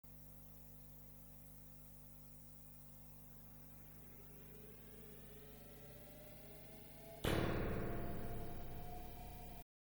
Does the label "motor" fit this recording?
Yes